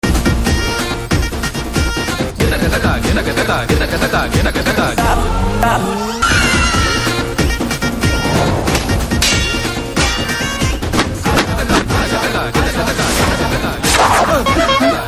Trailer BGM Ringtone; Housefull 4

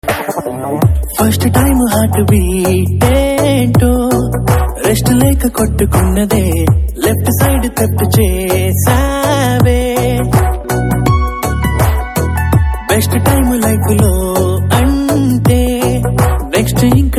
Heart Beat Ringtone

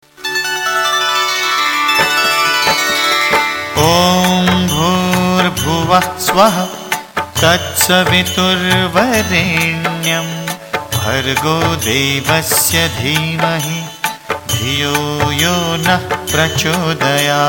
Gayatri Mantra Ringtone

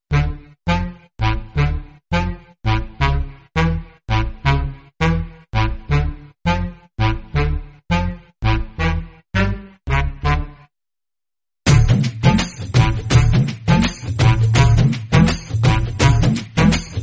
Classical BGM Ringtone; Kantri

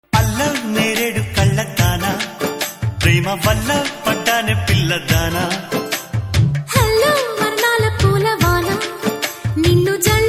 Ringtone

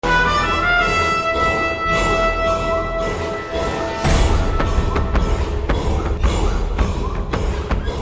Trailer BGM Ringtone; Asuran

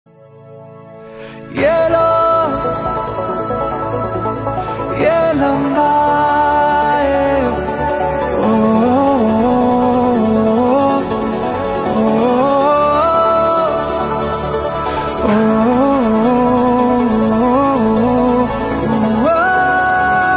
Starting Bit BGM Ringtone

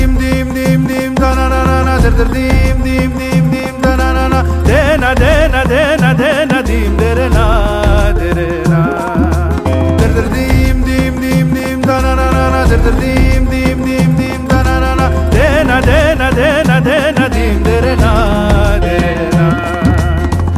BG Humming Tone

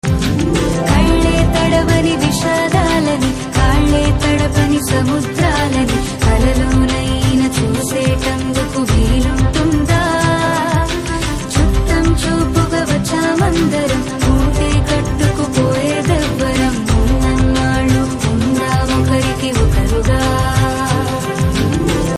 BGM Ringtone

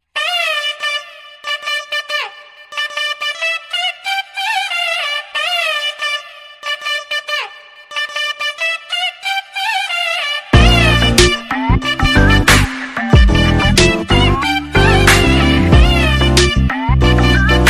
Clarinet Ringtone

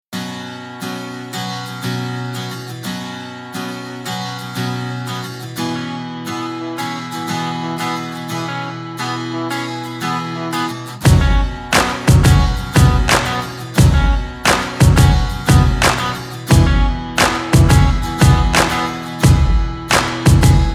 Instrumental Tone

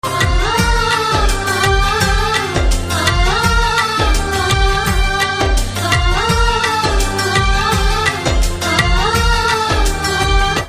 BGM Humming Ringtone

